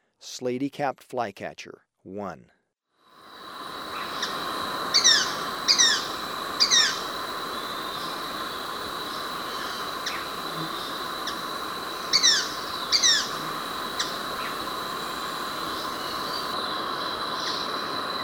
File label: Slaty-Capped Flycatcher, Birds of Ecuador; S. America